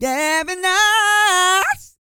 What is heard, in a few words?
GOSPEL; Vocal Planet Vol1 Gospel